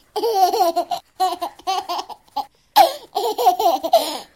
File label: tickle, baby; CosmicRage